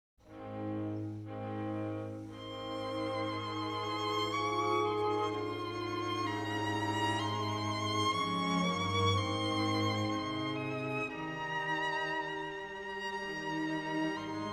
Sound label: String Quartet No. 10 in E-Flat Major, Op. 74 Harp - II. Adagio ma non tr; Adagio ma non troppo; Ludwig van Beethoven; Beethoven - String Quartets Nos. 1-16